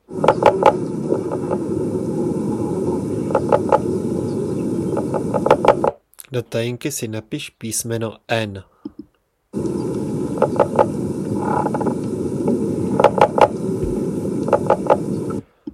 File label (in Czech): Blatnice skvrnitá; zabi